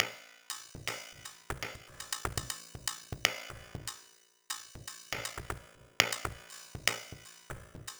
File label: Minimalism, Processed Percussion